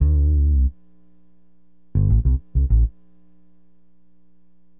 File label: BASS, Acid Jazz Funk